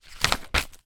paper notepad page turn; foley; zapsplat

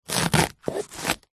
leek raw cut slice knife single

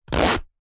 clothing bum bag belt zipper zip; zapsplat

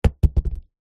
food watermelon drop ground bounce; zapsplat

